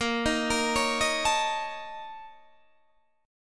Bb Minor Major 7th Double Flat 5th, Guitar, DADGAD; 20,18,20,18,18,19